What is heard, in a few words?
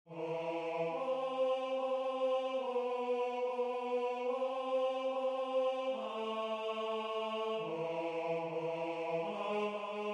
Tenor